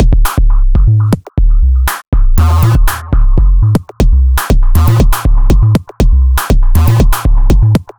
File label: Robot Thunder, Modular Electronica; Beats; Sonic Foundry (Sony Creative Software)